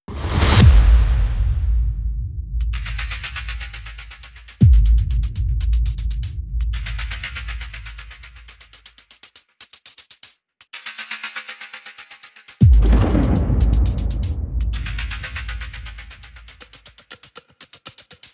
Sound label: tension drum comp fx; gerilim; By Burak Sound Bank; tension vol 22